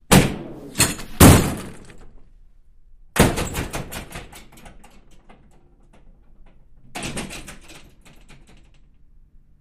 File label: Door Push Bar Wood Do